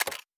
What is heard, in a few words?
Weapon Foley